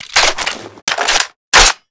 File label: m4 reload empty; Modern Warfare